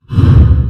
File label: Flame